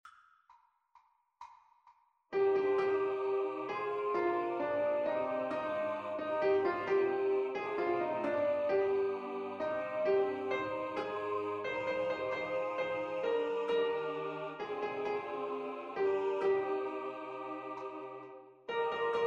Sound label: Tenor Piano; Version piano; Le Long chemin